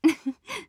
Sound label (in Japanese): ふふっ